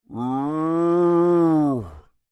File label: Creature, Human, Moan, Med